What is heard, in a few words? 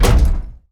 bang